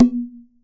TASHA, ETH PERC; WorldSounds

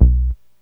909 bass, drums perc, Roland; Rubber Chicken Software Sample Bank

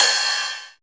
CHINA909, TR-909; 90 s Sample CDs; Sound & Vision - Gigapack I CD 1 (Roland); TR-808 & 909

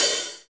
HH, Real

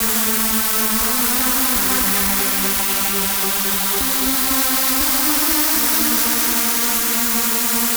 Trance Synth

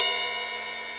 Crash, Drum Machines E-MU Pro-Cussion

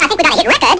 hit record; Amiga Soundtracker Sample Packs

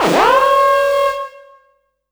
EFX Alarm Autobender; Polyend; Plughugger

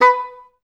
STAC B3, Orchestra; Best Service; Peter Siedlaczek's Advanced Orchestra

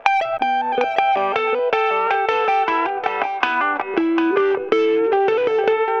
Delay Guitar

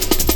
Lym Cut, Jungle, Drum Loops 165.5; Zero-G Jungle Warfare 1, 2 & 3

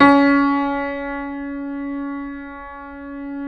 C#3 L, Keyboards Acoustic; USB Soundscan; AKAI

